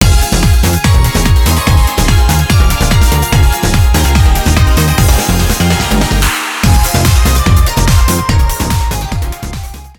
BGM THUMB DESERT; Busou Shinki Armored Princess Battle Conductor; arcade

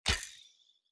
bow shoot

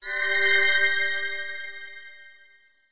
Resurrection

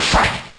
Magical Leaf

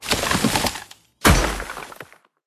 Frost Giant Death; Barbarians

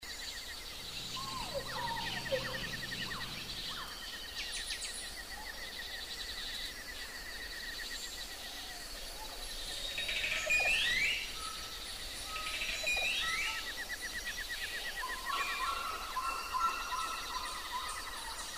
Island Jungle Environment (SFX); U.B. Funkeys

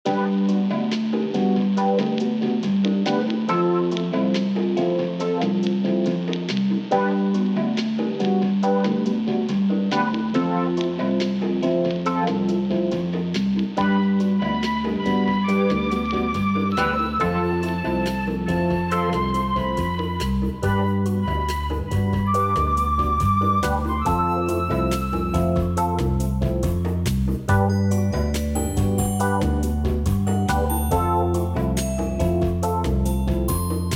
Lounge

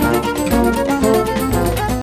mus cage forcefield; Rayman Origins; jungle world

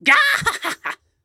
Lunge

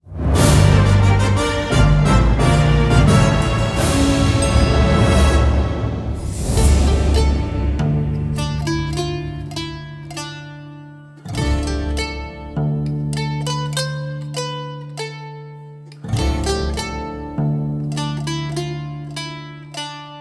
Tavern; For The King II

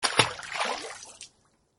water sound; Caravan Beast

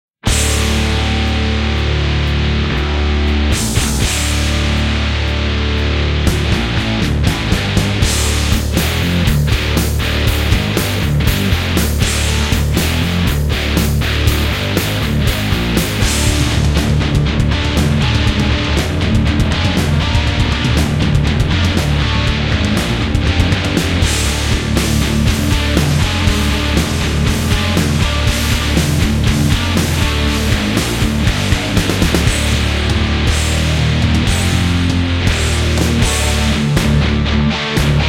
Music Fight; Naruto Ultimate Storm; android; StudioDOMA Kihan

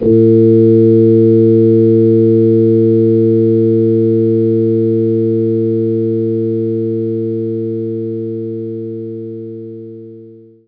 Hammond Organ; knights try

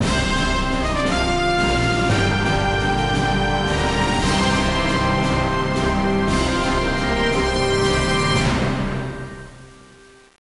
Intro Music; Military History Commander: Europe at War; nds

